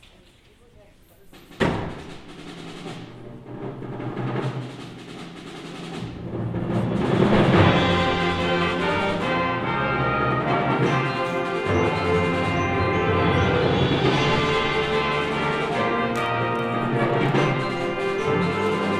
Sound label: Triumphant Fanfare